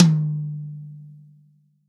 TOM, Real Drum Kit, POP KIT

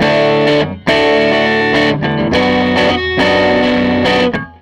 SG Guitar Loop; Sonic Foundry (Sony Creative Software) - Six-String Orchestra

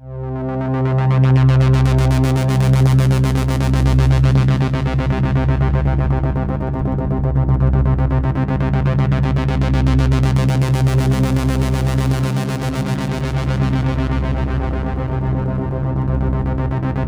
C2, Synth Line; NiceBeats

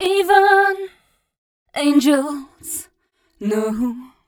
f mel d, fem Vocals; Mutekki Media; Diamond Vocals 2

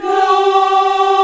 VOX, African Aahs, African Las

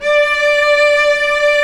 Vcs2 f Slo; STR Vcs 1-2 vb

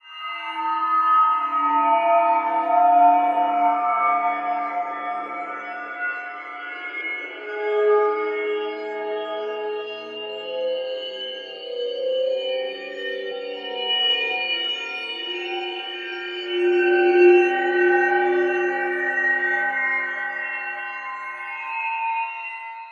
Bell Temple, Atmospheres; Zero-G Ambiosis

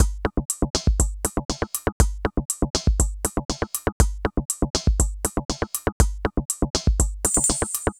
Cracking Beat, Electronic Beats; Sonic Foundry (Sony Creative Software); Myths of Technology

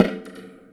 Thunder Drum; Sonic Foundry (Sony Creative Software); The Electronic Music Manuscript Collection; Battery 3 Sample Kits

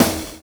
Dose Snare; Sonic Foundry (Sony Creative Software); Drums & Drones; Construction Kits

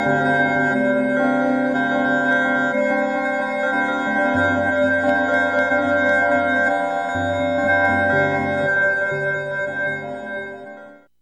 THE BELLS, SYNTH FX; AMG; Global Trance Mission VOL-2

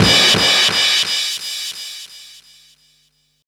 Ride set, Drum and Bass

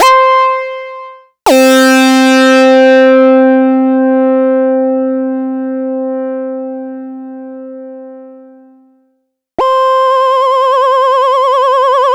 Moog Minimoog Leads and Keys

